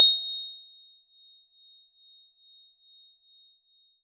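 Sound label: Warble Celeste DX100 A#0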